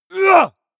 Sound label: male combat attack short; schreie; menschen